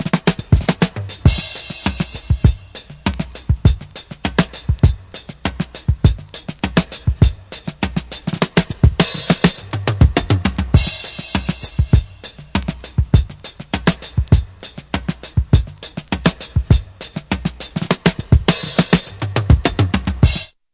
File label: classic break, DRUM LOOPS and BREAKS; VIBRIS CLASSIC BREAKS